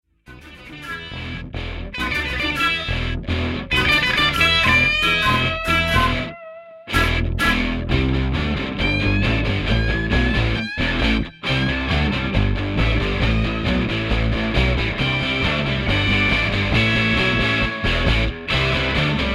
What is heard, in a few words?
Basses Rhythm